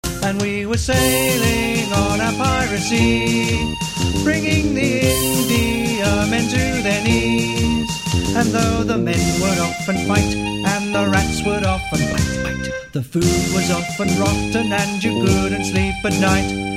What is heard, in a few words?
Chorus; Sailing on our Piracy